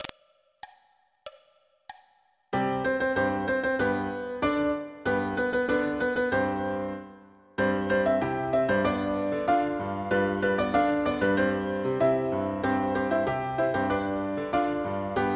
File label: samba